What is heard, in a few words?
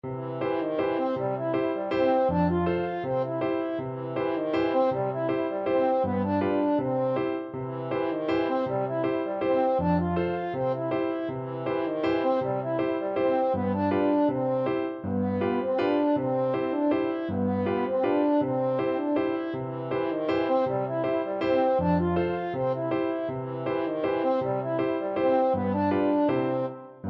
Zimmermo, french horn